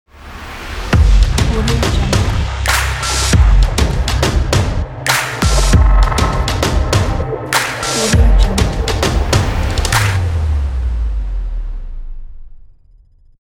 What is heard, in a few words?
cinematic ident